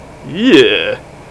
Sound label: YEECHHH, urla